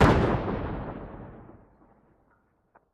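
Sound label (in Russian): подрыв вражеского дота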